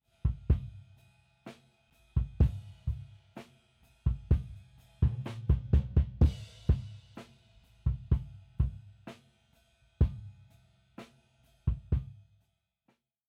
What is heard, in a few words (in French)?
batterie avec saturation